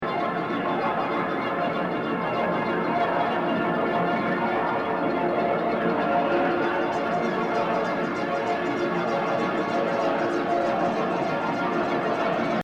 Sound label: carnival; asoundaday